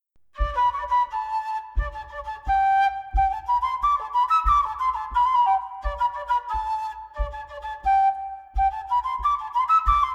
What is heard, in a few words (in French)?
Bourree berrichonne